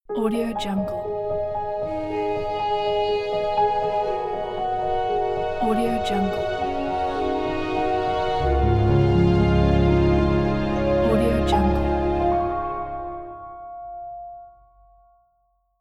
magical logo intro

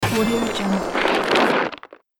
game plant regrowth; sonic-boom

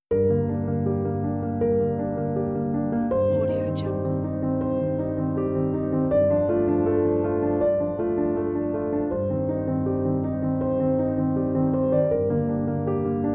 piano sadness

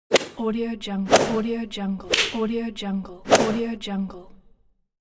short swishes

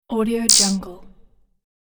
robot core user sound effect

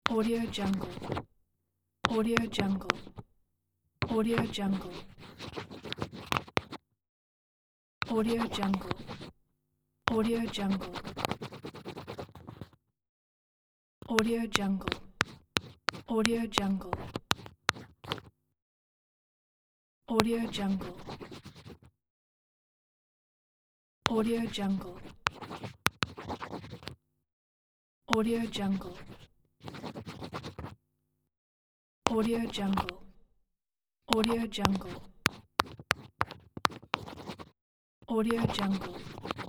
write scribble pen paper intimate

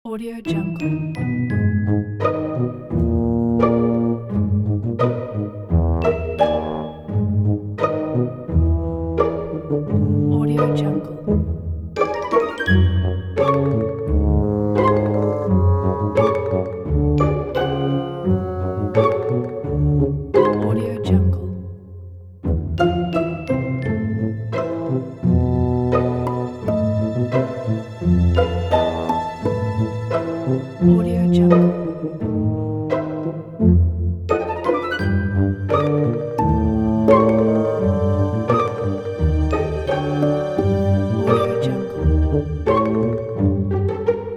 whimsical

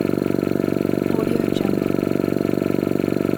air compressor loop

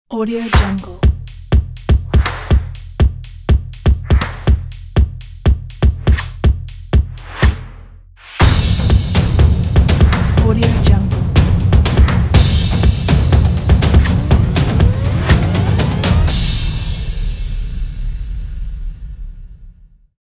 logo drum

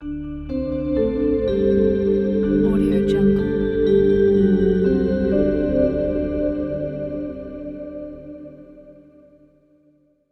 sting logo

